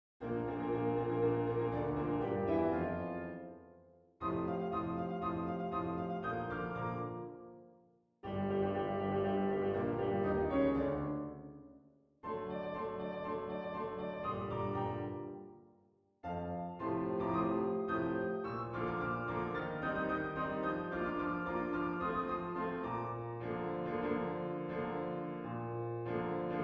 hymne national italien; mameli goffredo

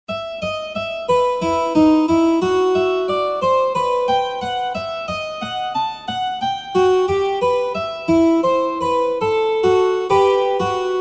petit duo en mi pour guitares

